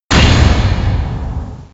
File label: bad end